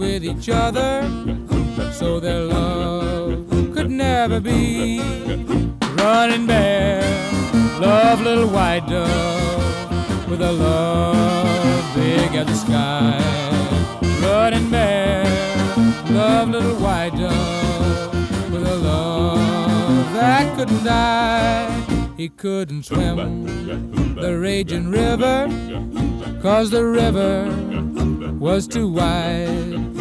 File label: country